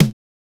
Tom Processed, Drums

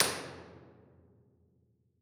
Real Places Bunker Binaural; Impulse Responses; Ableton Live 12 Lite

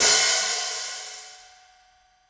Hihat Open Dry Funk Clean

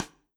Rim Sidestick Soft Dry Session, Drums

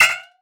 E-Perc Alien, Electronic Percussion; Drums; Ableton Live 12 Lite; Core Library

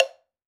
Wood Block Low

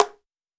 Perc Slap, Misc Percussion; Drums

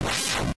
whoash; Super Smash Land

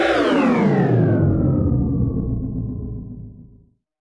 deactivate machine; OuterWildsModManager; OWML; AnonymousStrangerOW.TheStrangerTheyAre

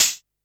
Hihat Closed; Ableton Live 12 Lite; Core Library; Drums

